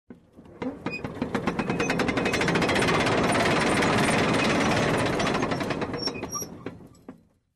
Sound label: Metal Gear Chains rolling